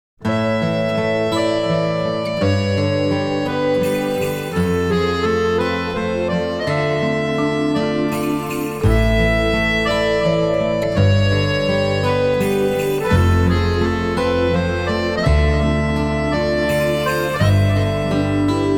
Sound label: accordion twilight